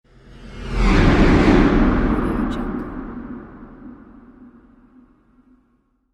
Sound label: cinematic whoosh